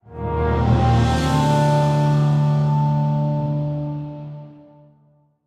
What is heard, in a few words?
Stinger; Kaelthas Sinstrider